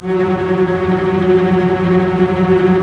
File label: STR SEC; Roland - LA Composer vol. 1